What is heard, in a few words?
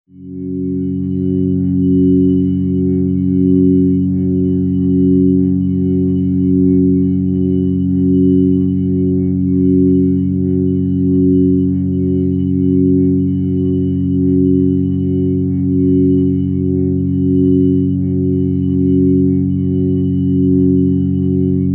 organ chord neutral f sharp